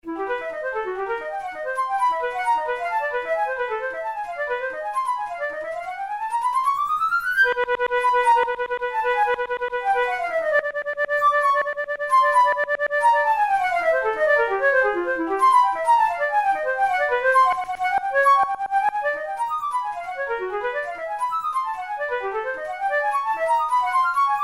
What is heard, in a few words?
studies for flute solo; karg-elert